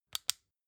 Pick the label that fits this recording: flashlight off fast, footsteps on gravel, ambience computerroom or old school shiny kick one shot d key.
flashlight off fast